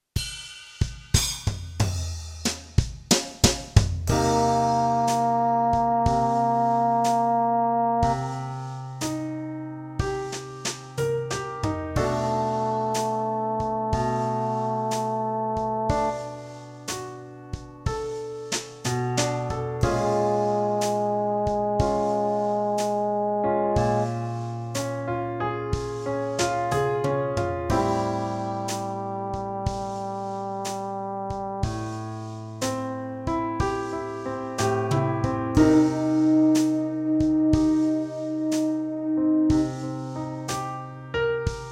Pitch Match, brass practice

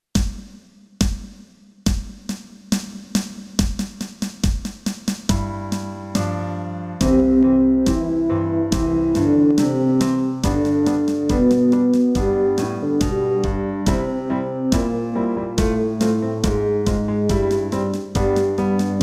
Old Duke Tune counter melody Bb, brass

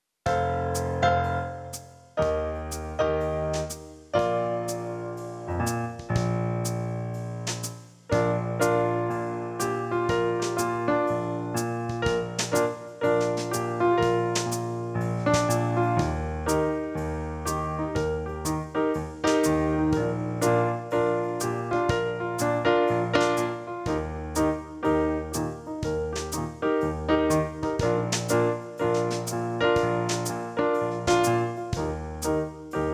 Impro 2 chords, brass; backing tracks

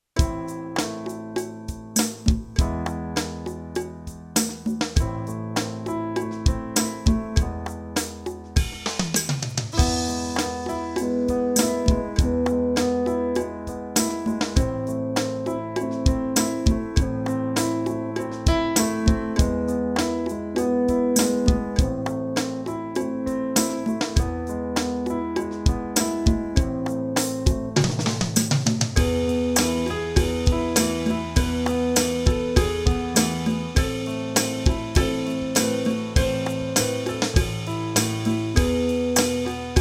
F mid

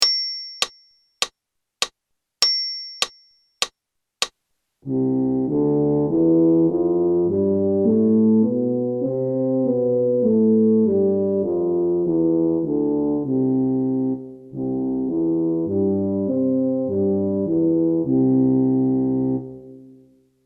A major in Eb C maj G maj in F count in, brass; backing tracks

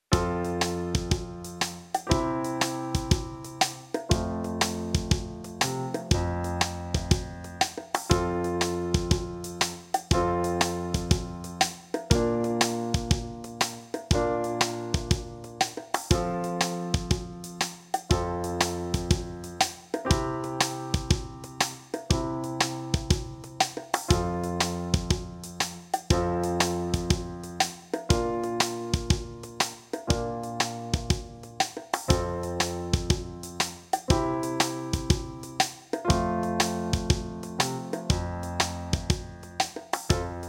Impro 3 chords in F, brass, practice

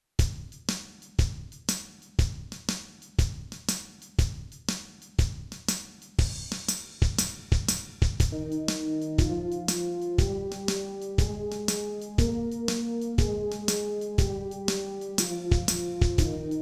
Scale F, brass, practice

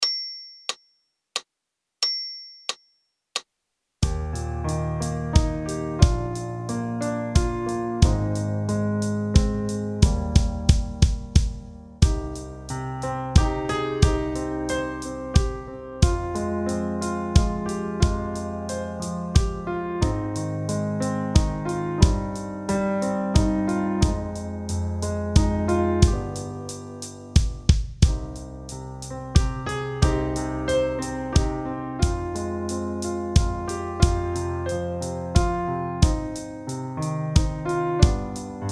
Slow waltz in Bb count in, brass

